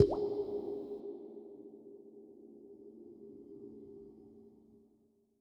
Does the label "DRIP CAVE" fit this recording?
Yes